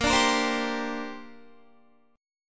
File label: Bb 6th Add 9th Bb Guitar; Bb6add9 strummed; 18,17,20,17,19,19